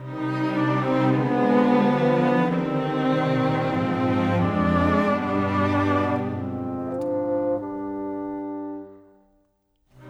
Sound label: Orchestra